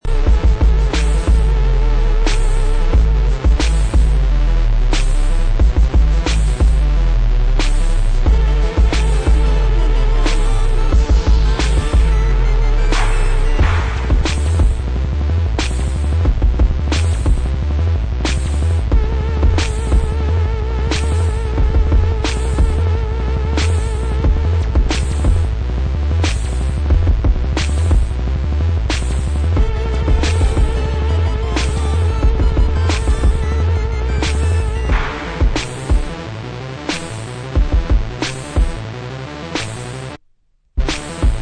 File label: remix instrumental; Warp